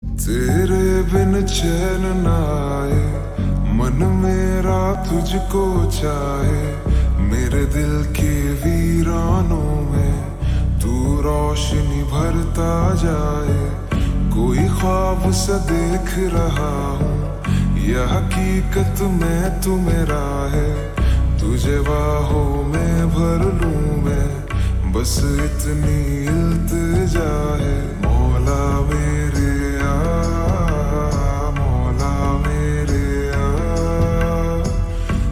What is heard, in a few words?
Ringtone Slow Reverb